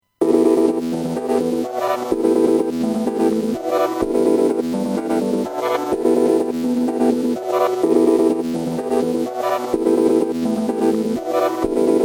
Synth Loop Original

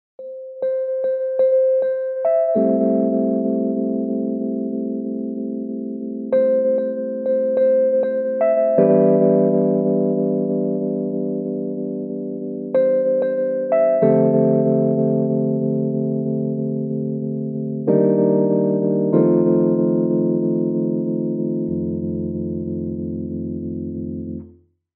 Korg Grandstage EPiano Tine Mark 1 Tremolo

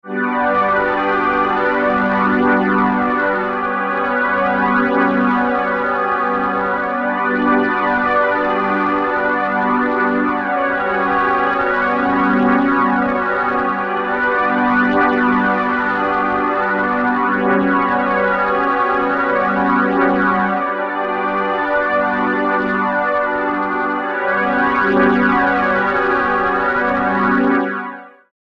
magnetismus 2 pad Eisen

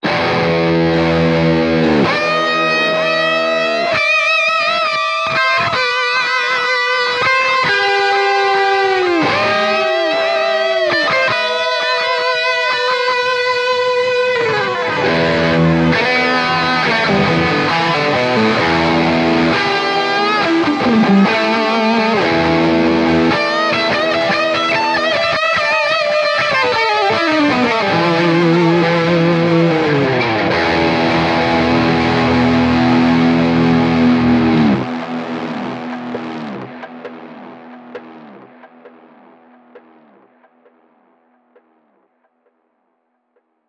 Shiva Delay; OD2

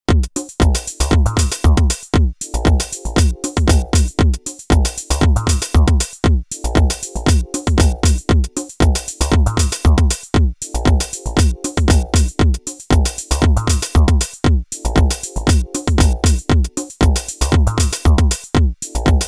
JoMoX Alpha Base

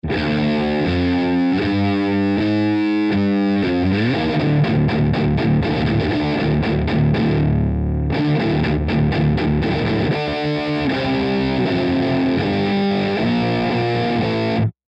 Heavy Distortion

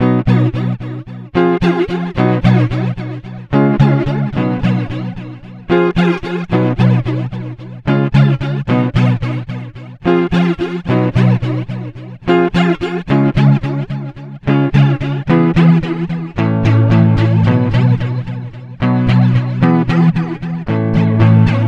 Starlight Echo Station Precision A

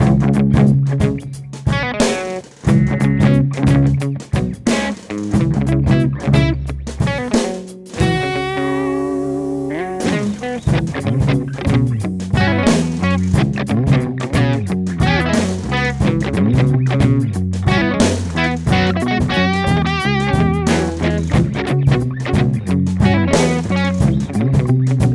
Cort MBM 1 Crunch

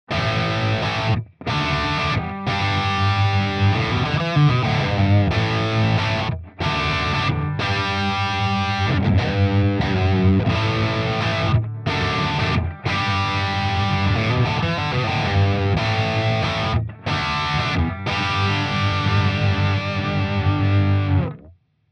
Clean Crunch; Archetype Abasi

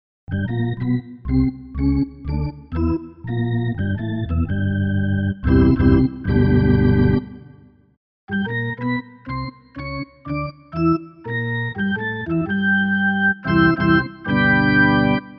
Retro Organs

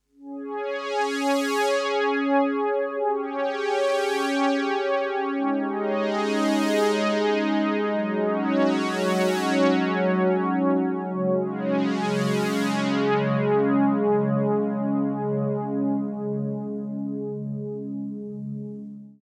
Roland JX 08

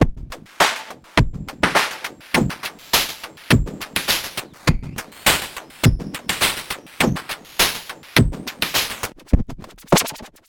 Waldorf 2 Pole Drums LFO HF Modulation

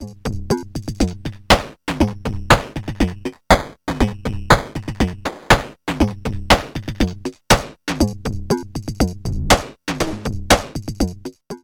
Transient Plus Sample Rate; Future Retro

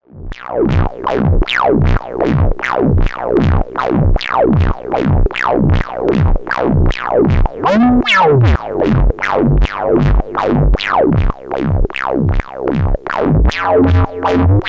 MS-20 Polyrhythm Drone

